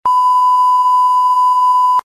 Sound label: beep